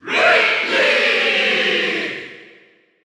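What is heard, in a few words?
Cheer German, crowd; Ridley; SSBU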